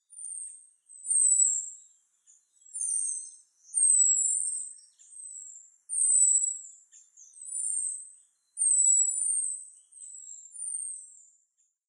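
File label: Japanese Thrush; kurotsugumi; nasushiobara; koe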